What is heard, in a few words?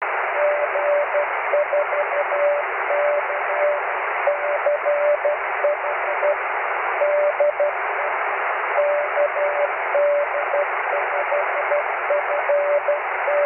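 continuous wave, 23cm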